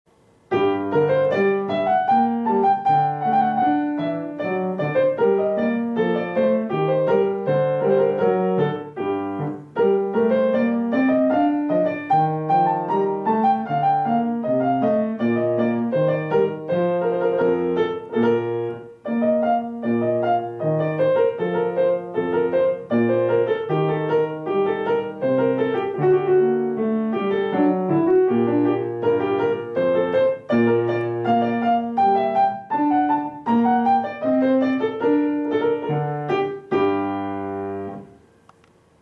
hornpipe; purcell henry

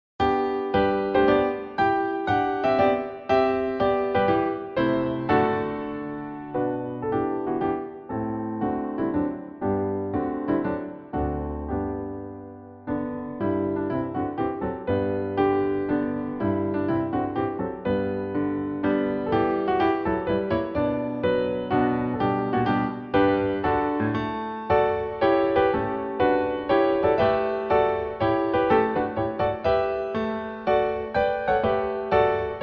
allegro non troppo; mendelssohn bartholdy felix

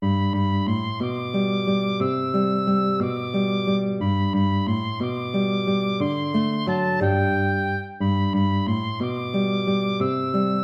waltz; Carulli Ferdinando

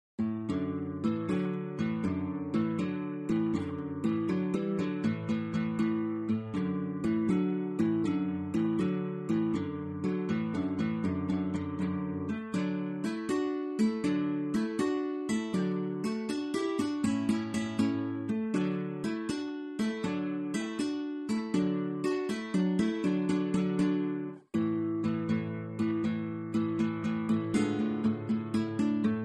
chasse rondeau; giuliani mauro

